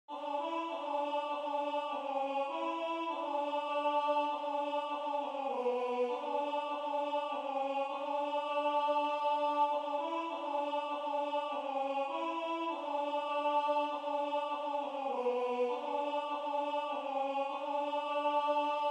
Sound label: Alto